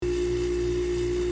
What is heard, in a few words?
machine; bruitages